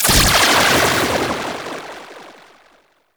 Aura Electrical Impact; AI Leaders

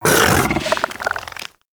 Bug Vocal Tranquillized Grunt; Characters; Warframe; Hunting Critters; InfKDriveBug

